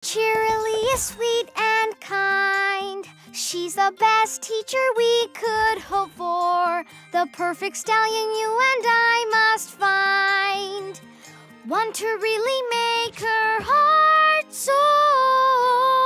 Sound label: Acapella; Season 2